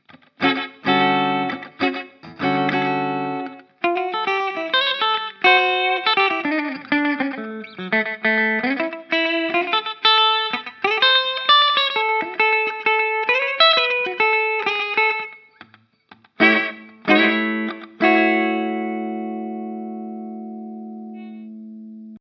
Ibanez AS93FM Mitte clean

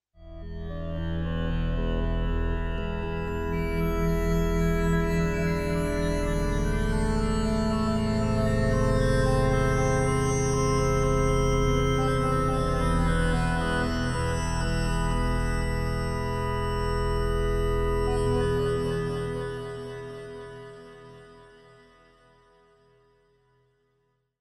TranzWave pur

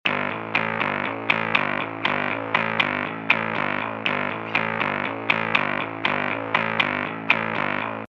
Bass mit Effekt Grammophone

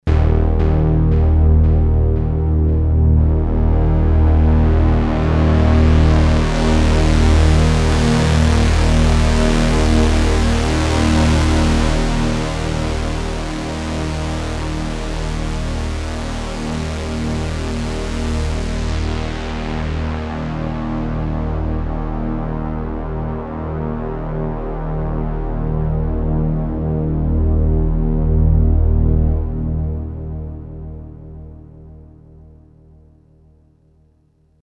Filtertest lowPass 2pol

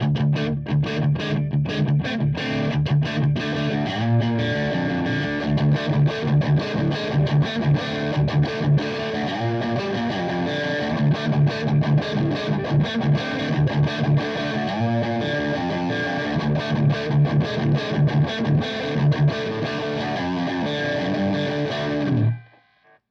Verzerrer Pedale Tube Screamer Gain Channel; HB Vintage

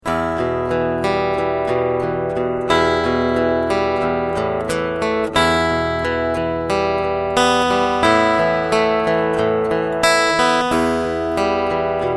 Picking ohne Cool Tube

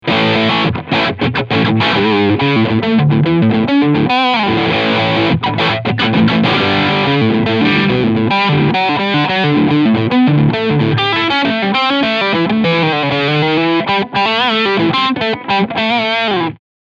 Gibson Les Paul Tribute STB Crunch Sound Steg PU

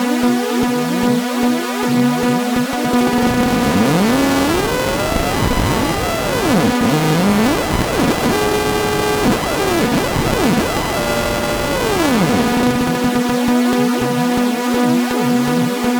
FM Aliasing mit Sync Trigger Audio Rate; Demon Core

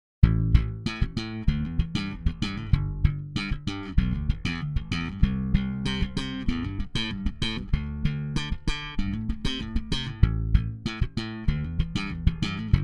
Bass unbearbeitet

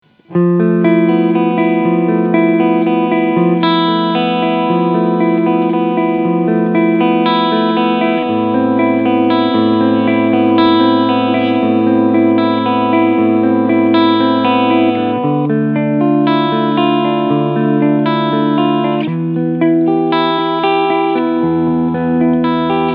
L7 TBL Cleansound beide PUs; Larry Carlton

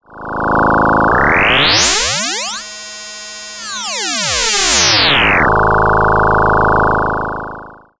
E-RM Polygogo Cycle On Parameterfahrt OP ratio fast

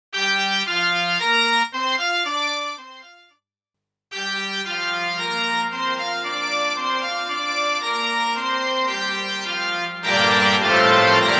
Lexicon Model200 Hall Orgel

